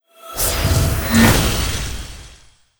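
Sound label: wind spirit spawn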